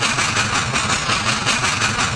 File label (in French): wagonnets; Mobiclic; Jeunoel